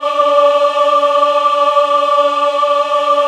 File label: D4 MW AH, Choir; USB Soundscan vol.28; Acoustic & Synth; AKAI